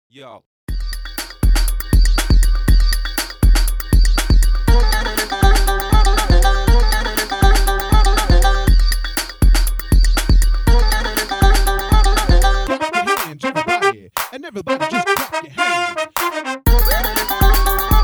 Marrakesh Splash 120bpm remix

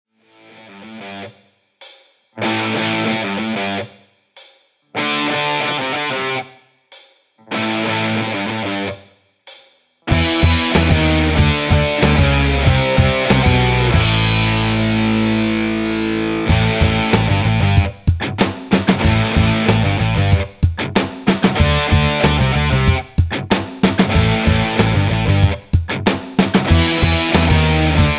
94bpm

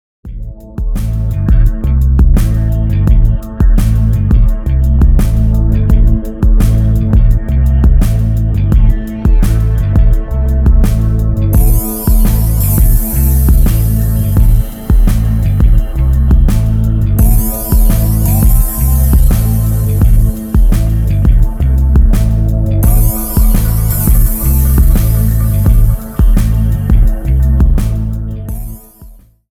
Dark Room 85bpm remix

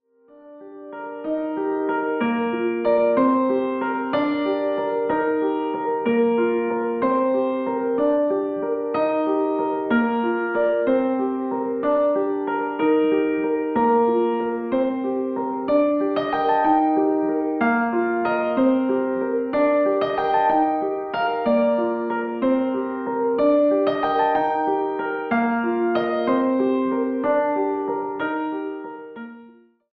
187bpm

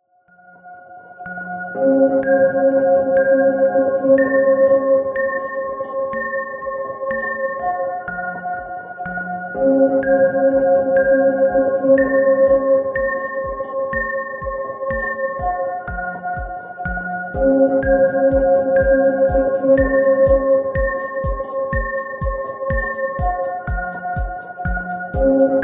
Dreams 134bpm

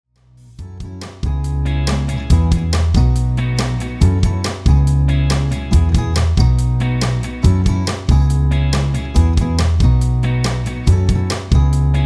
Rise And Shine 140bpm

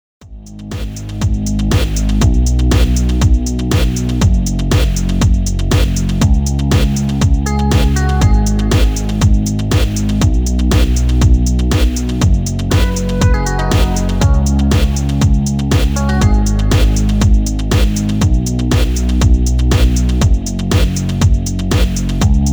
120bpm remix

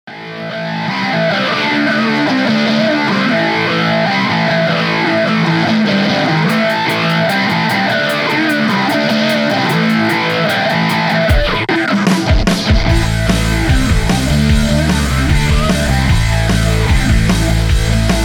150bpm remix

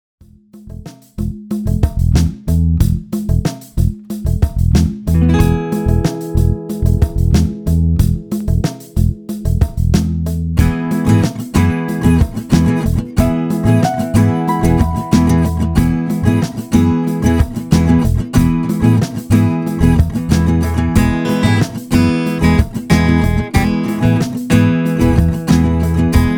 In The Forest 185bpm remix

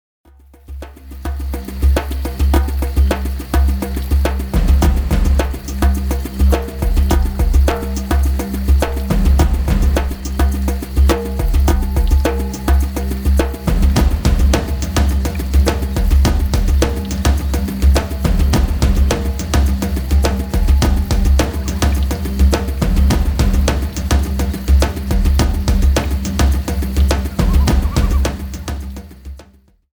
Jungle Fever 105bpm

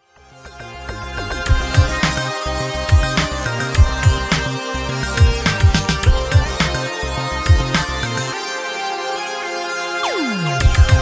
Clone Workout 105bpm

